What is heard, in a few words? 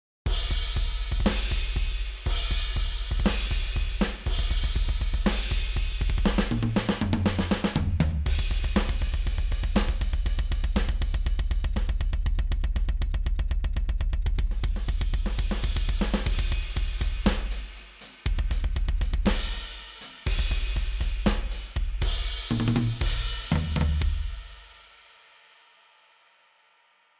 7khz added to kick drum